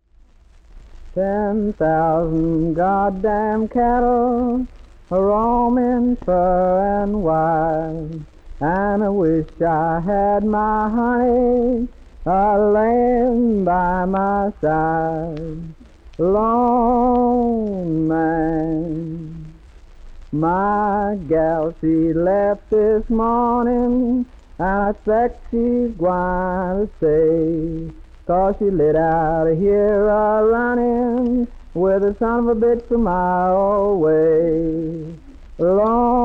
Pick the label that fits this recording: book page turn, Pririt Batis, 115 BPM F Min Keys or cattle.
cattle